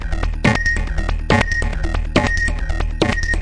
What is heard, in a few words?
140 hexbeat, DRUM LOOPS and BREAKS